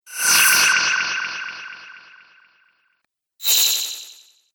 Magic Spells; Magic Sound Effects

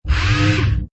Hi Tech Panel Servo Button

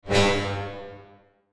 Sci Fi Buzz Bleep; Tech; Blastwave

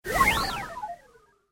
Fast Droid Flyby

